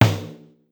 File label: Snare, Pitched Down; Clavia DDrum-AT